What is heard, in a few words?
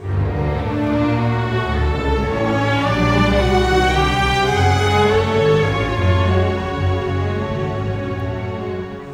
Cinematic Strings, Orchestral